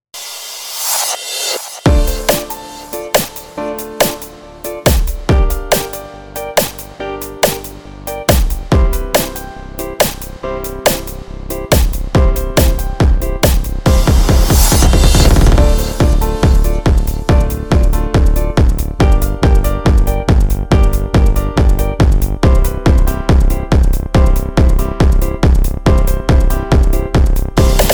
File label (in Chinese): 电子舞曲 拉丁爵士融合 波萨诺瓦 EDM Bossa Nova; cubase 编曲助手